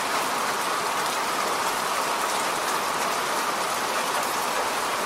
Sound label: Storm Sounds